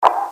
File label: swish